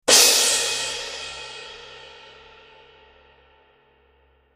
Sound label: crash 16 hit, cymbal; sabian; hhx xtm